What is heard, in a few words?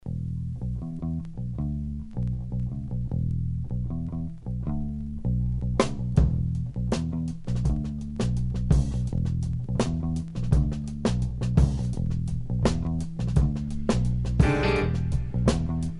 swedish break